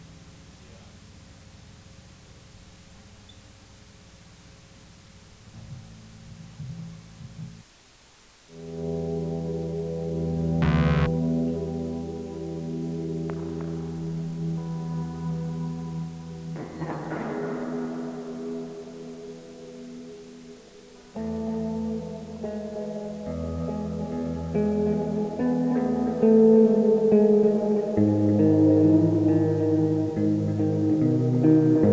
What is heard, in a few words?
Monitor Mix, overdriven